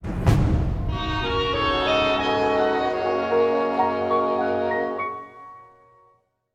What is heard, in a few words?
Quest Failure; Fallout Shelter